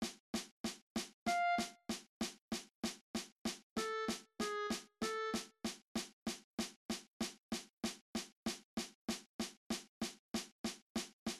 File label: Just a Closer Walk cut time clarinet; senior high band